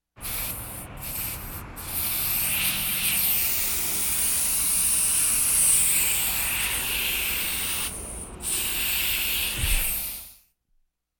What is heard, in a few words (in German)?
luftschlauch; Geraeusche